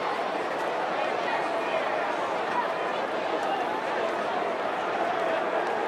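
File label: BMX Crowd Ambience Idle Loop; Crowds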